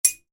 Navigate: Short SFX For Navigating Between Options; Button Sound Effects; Buttons (Sound Effects:Multimedia); Stuart Duffield